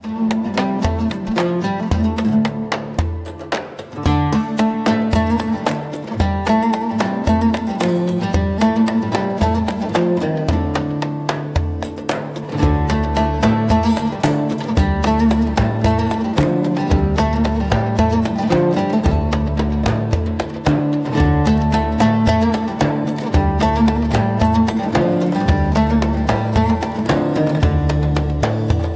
desert sunset

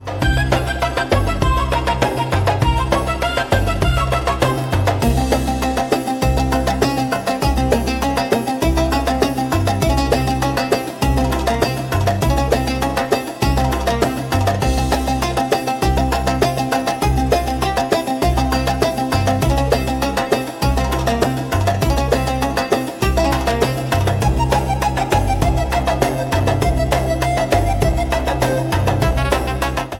oriental dancing

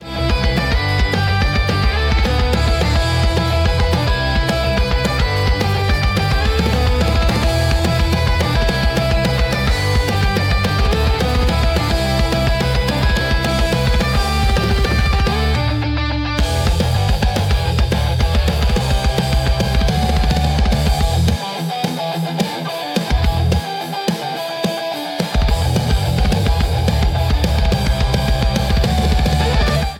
riff

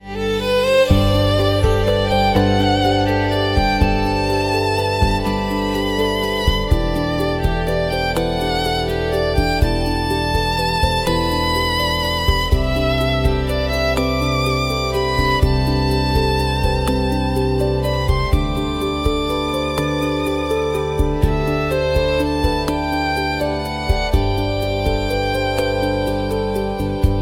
whispers of idle, puzzle flow

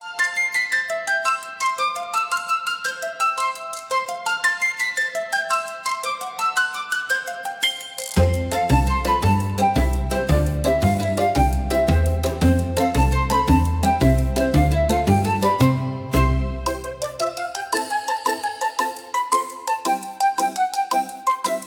country

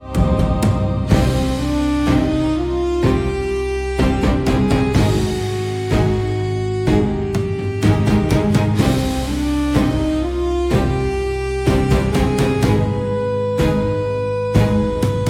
scary crypt

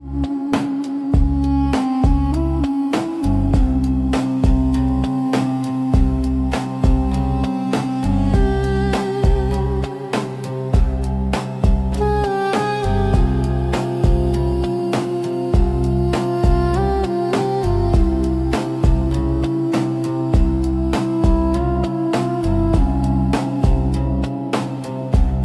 restless night

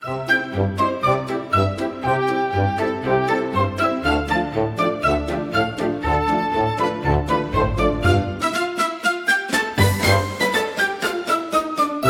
circus acrobat

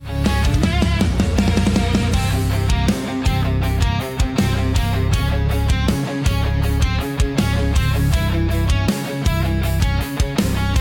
agressive fight, eastern life

